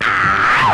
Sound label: scream male up; ooh ahh